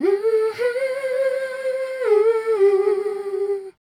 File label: MELODIC A, Voice